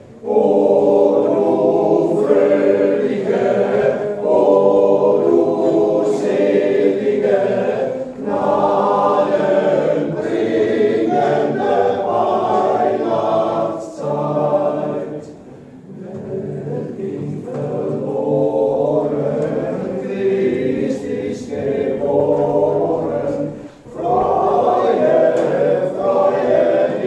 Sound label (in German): Oh du Fröhliche; Weihnachten 2019